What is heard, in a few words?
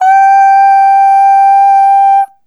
Ooh Short G4 Dry; Harmonic Building Blocks